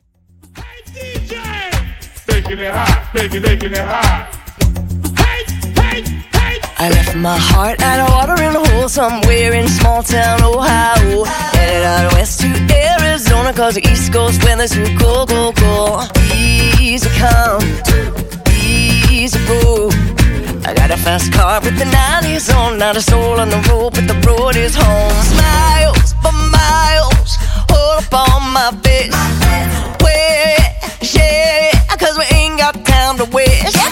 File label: Hype Intro Out Country Redrum Clean 104 Bpm